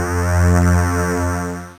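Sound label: synth dance; Best Service Gigapack 1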